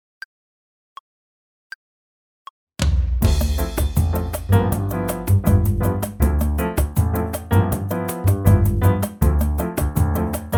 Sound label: D